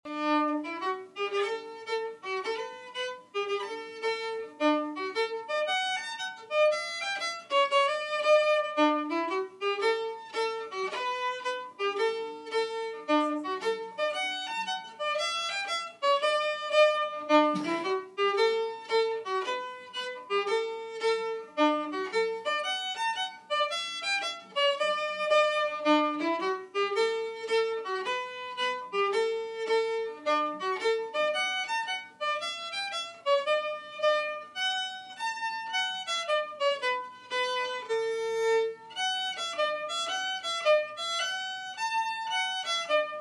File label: Schottische In D; efter Anna Lindblad